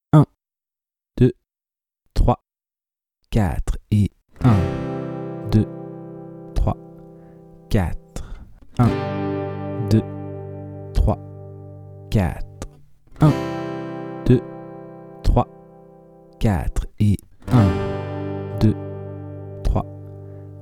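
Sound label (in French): lent Passer de C à G, suites d'accords; De 0 à vos premières suites d'accords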